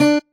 marimba; ゆめ2っき

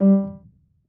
marimba piano; ゆめ2っき

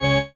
marimba grave; ゆめ2っき

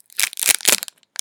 open

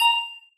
marimba; ゆめ2っき

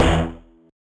marimba steeldrum; ゆめ2っき

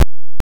jump; ゆめ2っき

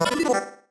marimba dice; ゆめ2っき

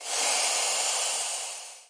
steam short; ゆめ2っき

